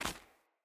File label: Run Stone, Footsteps; Characters; Mother